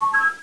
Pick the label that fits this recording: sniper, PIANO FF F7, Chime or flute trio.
Chime